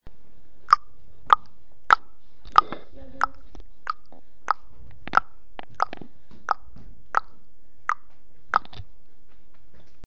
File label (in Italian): orologio, si schiocca la lingua sul palato